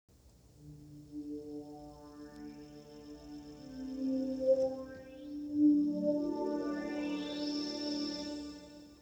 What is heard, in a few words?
chime slow